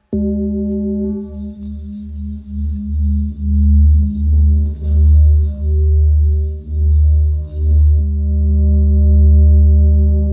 Bol ramgandi sol diese

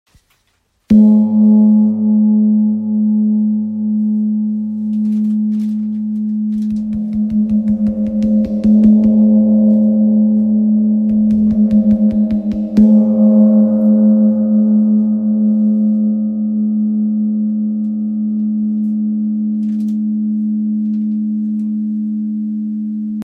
Wind gong la 40cm

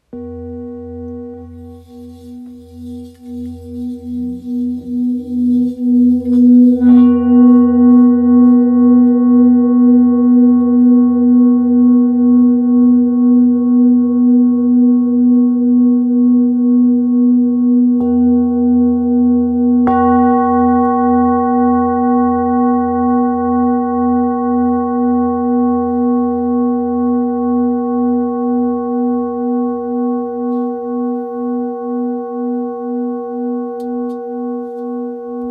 Bol therapeute Mi 2.340kg

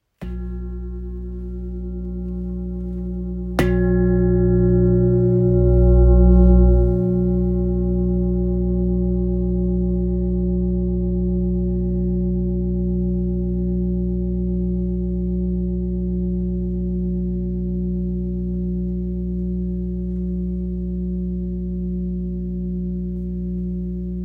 Gros bol fa 9.59kg

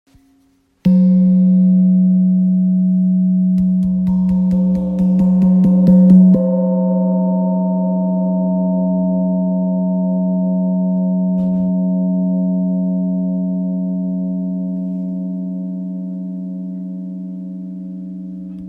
Chau gong fa diese 40cm

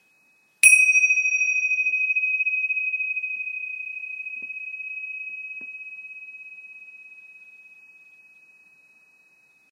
Cymbales 7,5cm signes auspicieux

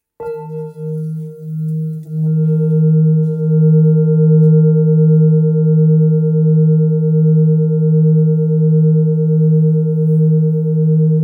Bol super lune belier re diese; Bol Chantant Full Moon

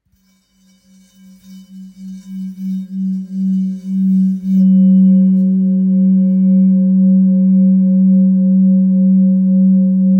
Bol ancien sol

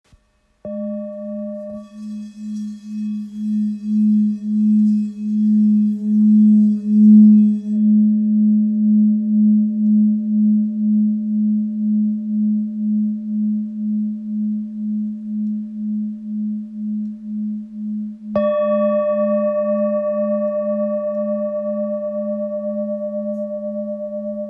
Bol ancien sol diese

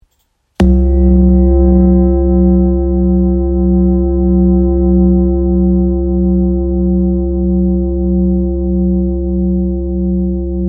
Bol chantant therapeute 1.57kg fa diese

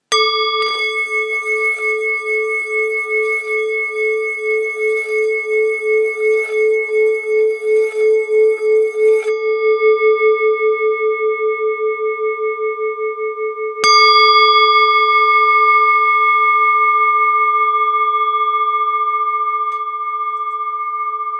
Bol ancien do diese 245g

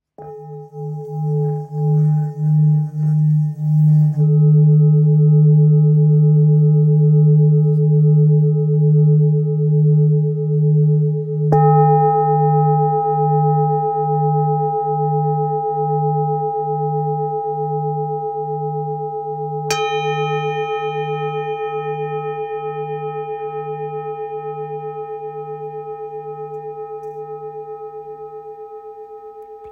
Bol full moon re 770g

